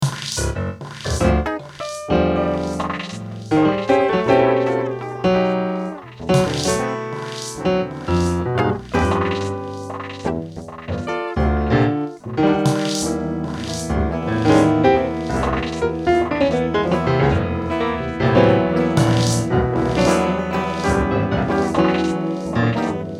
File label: piano